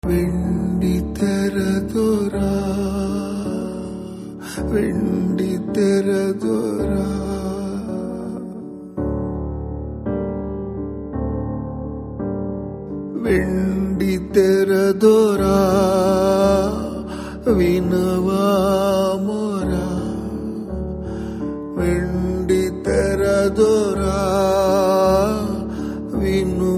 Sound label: Telugu Ringtone